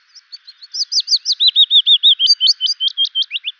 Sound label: bird, ambient animal